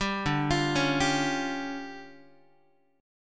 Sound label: D Minor Major 7th Double Flat 5th, D, Guitar; DmM7bb5; arpeggiated; JGuitar